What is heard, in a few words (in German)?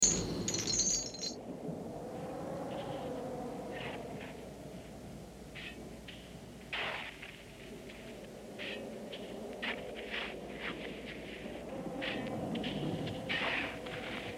totengräber, Horrorgeraeusche